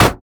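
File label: sad mummy basic attack; LoL; amumu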